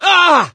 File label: hurt major, gambler, survivor voice; source engine; left 4 dead 2